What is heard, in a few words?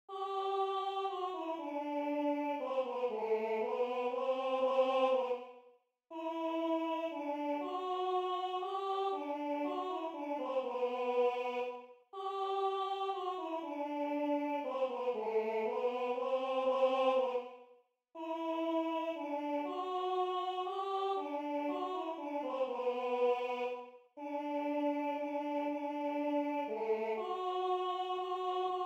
Tenor I; Bauernmesse; 5. Sanktus